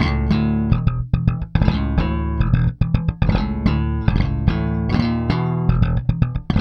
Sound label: Slap, Bass; Bass X